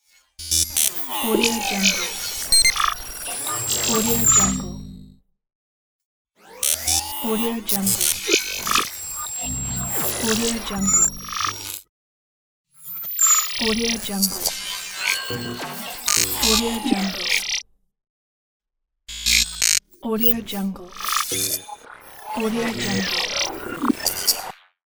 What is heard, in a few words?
long glitch; audio therapy